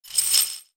Chain Rattle; ambience; gnarled forest

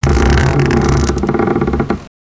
Jail Cage Swing; gnarled forest

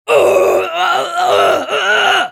minifig shocking death

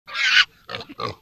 Pet Warthog Idle

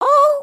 need to pee again; emotes

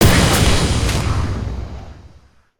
Serpent Smashable; destructibles

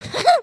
mission kind fail; emotes

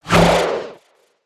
Throw; Valiant Knight

